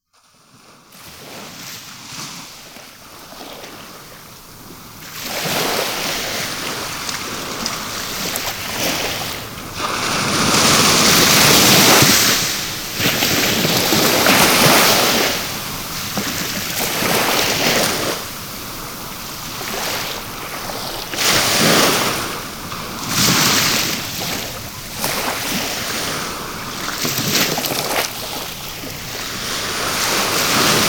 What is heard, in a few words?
Nature Sounds, Sounds from the Seas and the Oceans, Sounds from the Sea Sea Foam; Nature Chill Out 2014